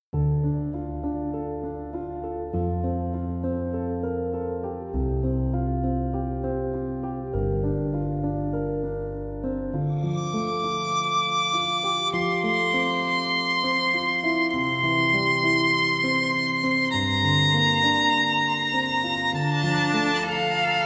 Instrumental Version

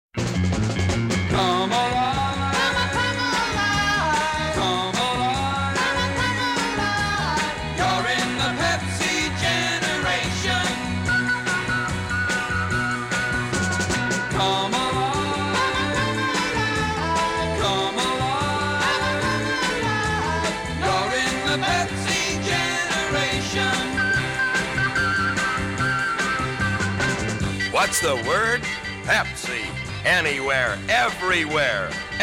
Commercial, VOCAL CALIFORNIANA AÑOS 60; Pepsi Commercial #1

